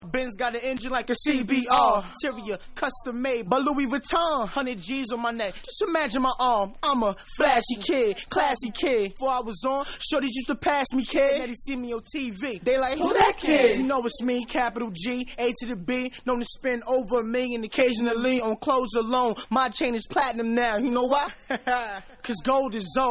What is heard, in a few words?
Acapella